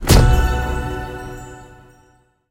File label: scatter Hit; rome